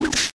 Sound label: Big Scorpion Tail Whip; Playable Tutorial Level